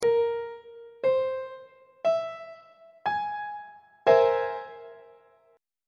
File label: d double flat augmented 7th 3rd inversion on treble clef